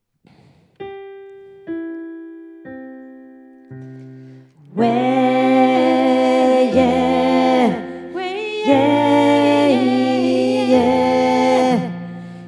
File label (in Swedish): Rid over skog stämma 3